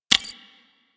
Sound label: Console Hack Button Press; Warframe